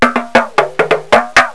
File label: drum talk